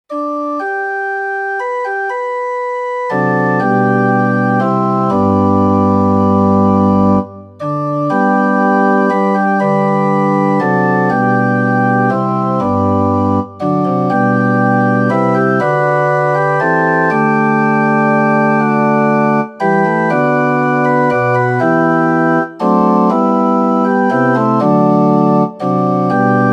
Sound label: amazing grace instrumental